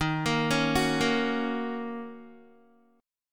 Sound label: D sharp Minor 7th D sharp, down, Guitar; D#m7; arpeggiated; 4F,4A,5C,5G,5C,6E; chord shape